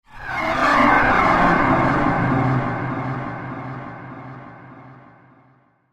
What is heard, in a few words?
Flyby Delay Whoosh